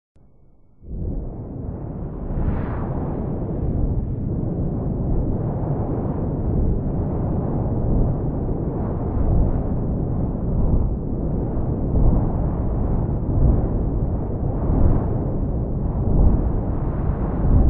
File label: wind; short events; manager